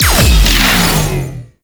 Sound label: Overguard Depleted; Warframe; Eximus